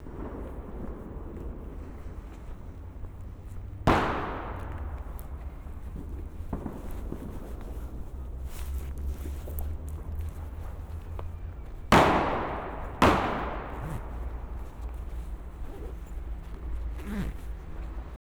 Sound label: Fireworks; SoundScapes